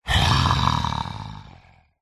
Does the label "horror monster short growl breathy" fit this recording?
Yes